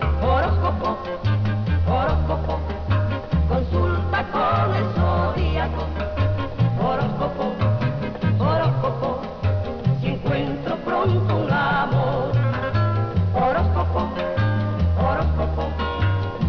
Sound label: SPANISH FLAMENCOS; vinyl